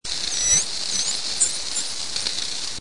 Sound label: whistle dolphin